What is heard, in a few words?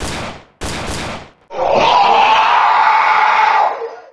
the shooting scream